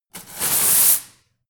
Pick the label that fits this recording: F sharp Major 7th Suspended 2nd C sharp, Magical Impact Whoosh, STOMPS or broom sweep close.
broom sweep close